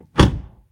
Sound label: close; block